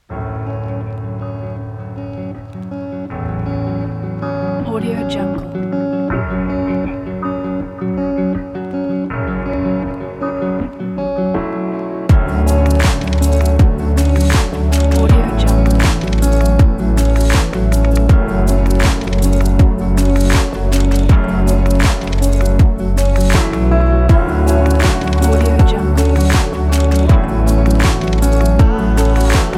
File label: chill